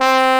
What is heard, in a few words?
BRASS C3, POP BRASS; AKAI S6000 CD-ROM - Volume 1; BrassWind